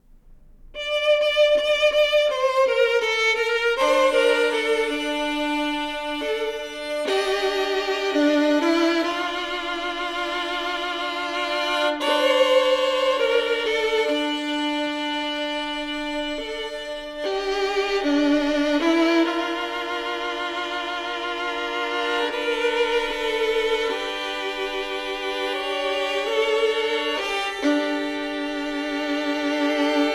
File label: Duos for Two Violins, Wedding Song